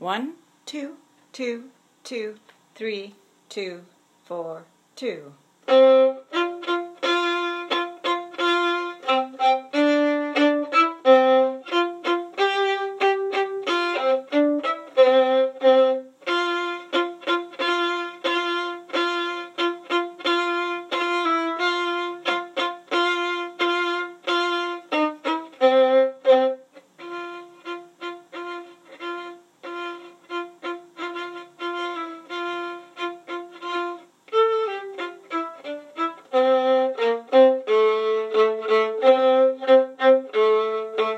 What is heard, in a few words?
violin